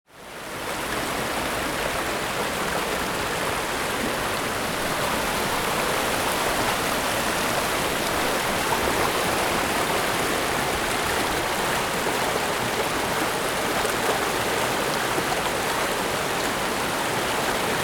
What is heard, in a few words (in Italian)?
voce Rio Cavallo